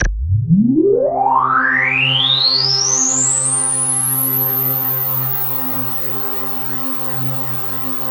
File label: KORG DW8000, Vintage Keyboards